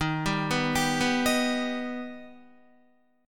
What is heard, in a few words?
Eb Augmented Eb, Guitar; arpeggiated; 4F,4A,5C,5G,5C,6E